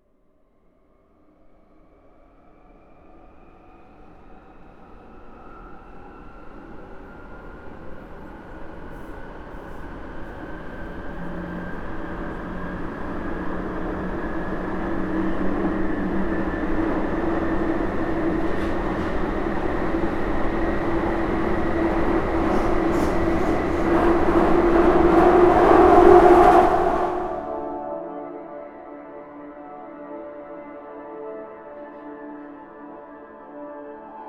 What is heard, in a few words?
tube train into station; Sound design for the opera composer: concepts and methods; Vicky and Albert audio cues